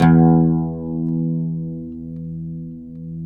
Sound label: PLK OUD E; Roland - LA Composer vol. 3